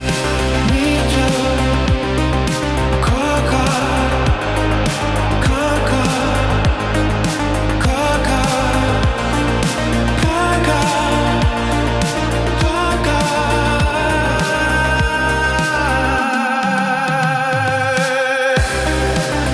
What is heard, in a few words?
electronica